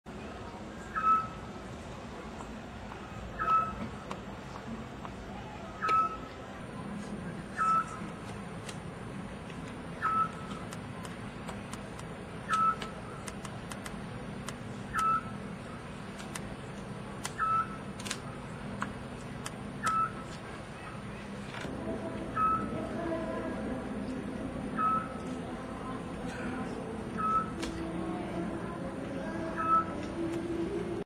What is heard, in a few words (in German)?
Zwergohreule